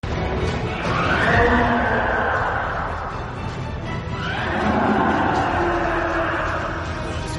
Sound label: titan roar; armored titan